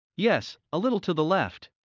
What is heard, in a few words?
Heart mid; Classroom humanbody